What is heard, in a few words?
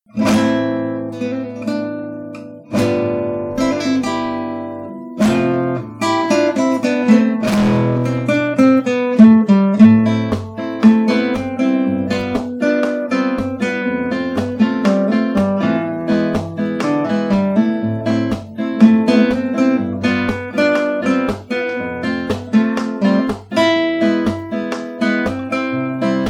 spanish guitar